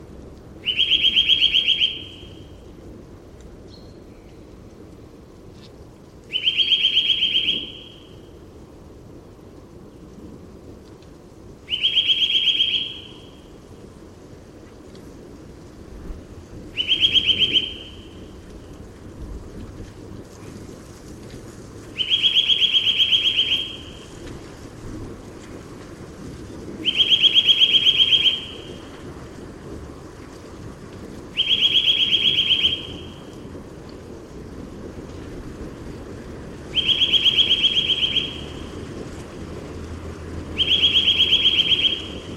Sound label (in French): sittelle torchepot; chant